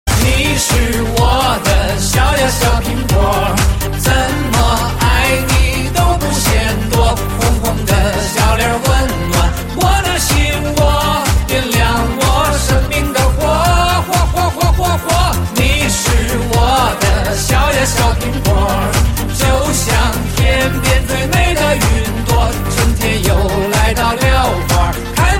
手机铃声

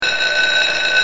电铃; 钟声; 广播室铃声系统

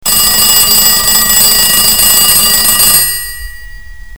电话铃声

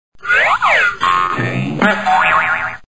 卡通

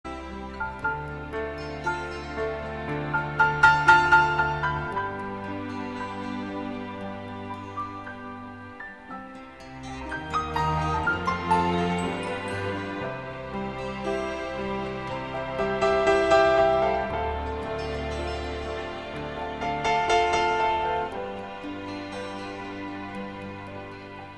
午休铃声; 小学; 广播室铃声系统

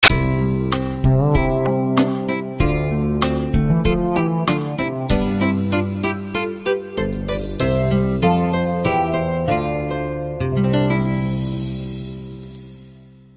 婚礼片头; 20秒

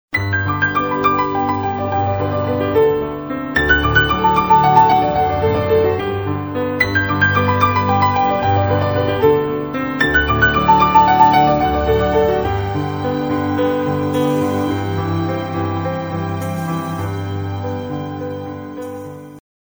音乐铃声

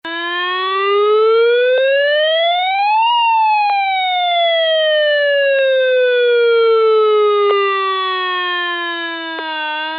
消防警报; 告警; 广播室铃声系统

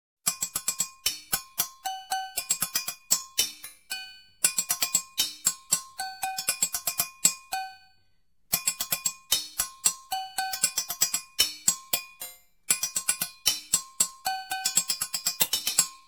West One 鼓乐素材

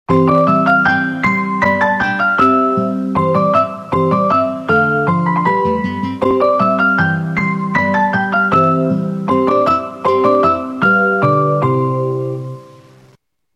音乐铃声; 钟声; 广播室铃声系统

